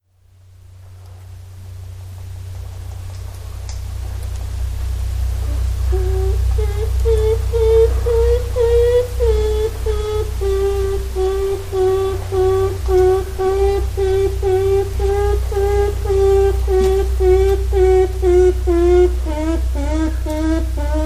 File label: Curious Natural Sound Like Child Humming; alaska acoustic highlights